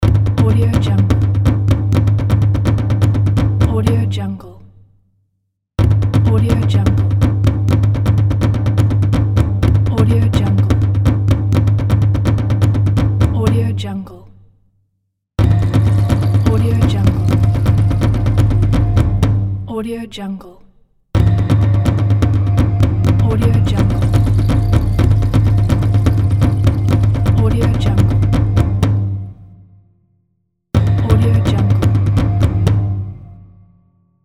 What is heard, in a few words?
african jungle run